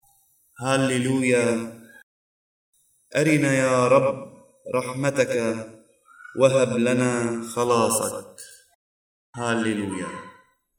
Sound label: Gospel Antiphon; Sunday Advent